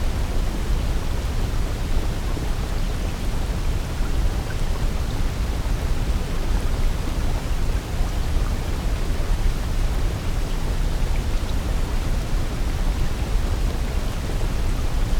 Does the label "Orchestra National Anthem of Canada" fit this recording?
No